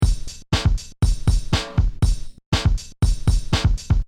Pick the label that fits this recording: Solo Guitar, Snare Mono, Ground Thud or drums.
drums